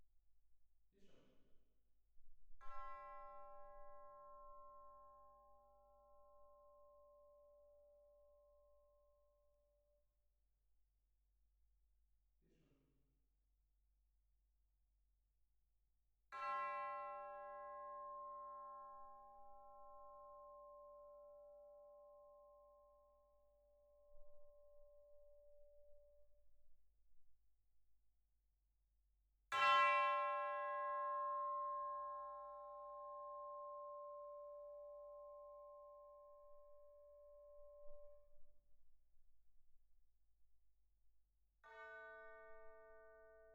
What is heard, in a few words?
Twin R Perc